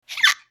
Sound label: weird hiccup, voices